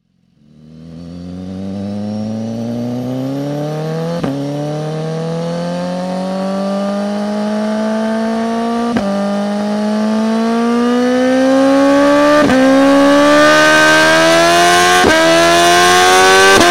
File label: BMW S1000 RR